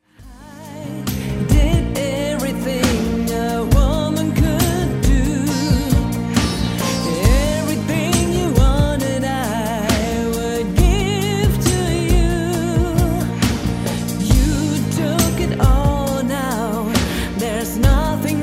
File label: GAME OVER